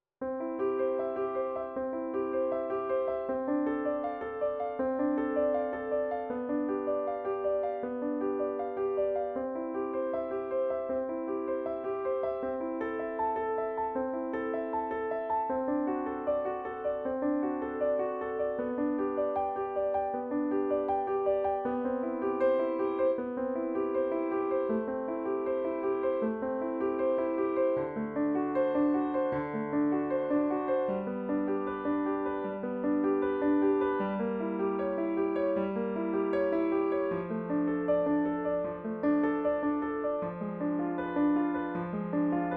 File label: Bachs Preludium i C dur